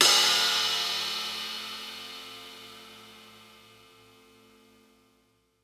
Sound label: crash; eddyflux